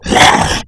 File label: zombie pain; cstrike; zombie swarm